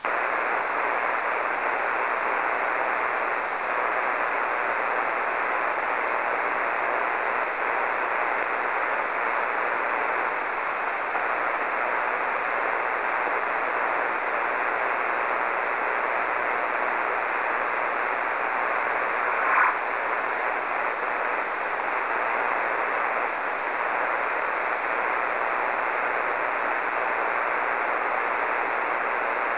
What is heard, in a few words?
ping